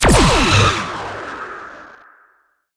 photons

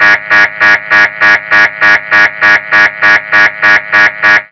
red alert; EnterpriseB; TMP

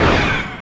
pulse phaser

